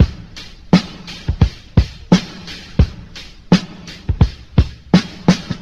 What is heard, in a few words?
Drum loops Boombap